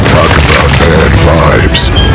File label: vibes